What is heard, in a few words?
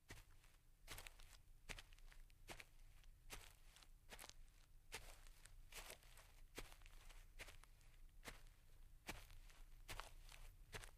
Footsteps On Grass